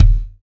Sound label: clean kick drum e key, Royality free bass drum single hit tuned to the E note. Loudest frequency: 150H; kick drum samples